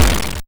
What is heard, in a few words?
YM Noise; NOISES; ChipShop Deluxe; ChipShop Hits